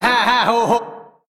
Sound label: ha ha ho ho